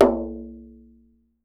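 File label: large brass, dunbek; Voice Crystal - Steve Reid Definitive Percussion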